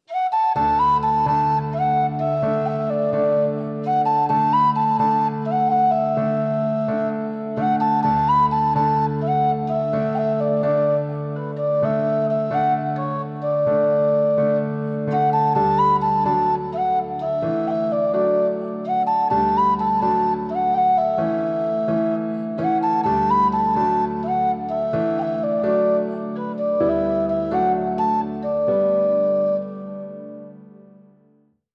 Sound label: Tin Whistle & Piano; 30sec